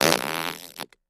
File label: Close Up Fart; farts